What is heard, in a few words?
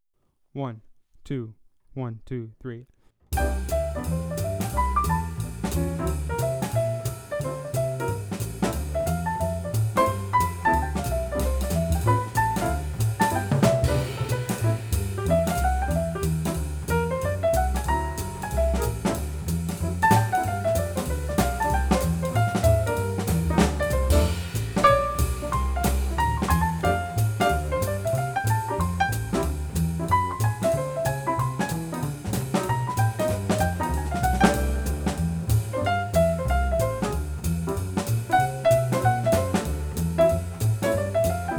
piano